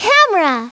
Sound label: camera; Treasure Galaxy